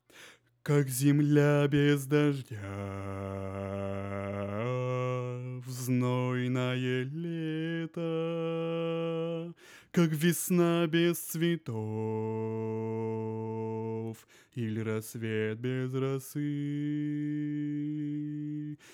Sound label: bass